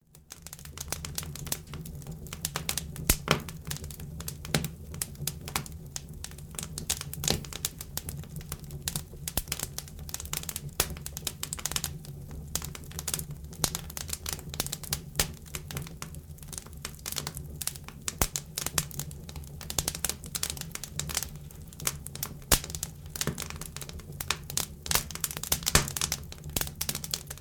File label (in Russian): audio effekt goreniye ognya s potreskivaniyem; prirodnyye zvuki zimy zimniye audioeffekty